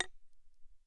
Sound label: worker mine; propack